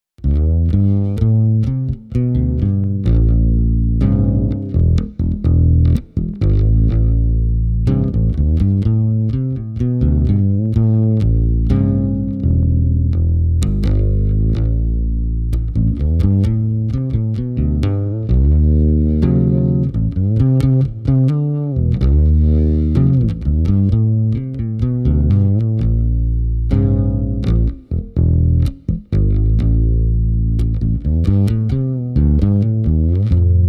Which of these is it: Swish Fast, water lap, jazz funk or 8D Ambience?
jazz funk